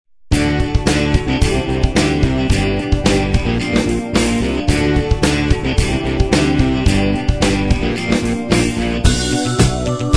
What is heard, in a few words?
Scandinavia Rock; Suite Collection Vol.1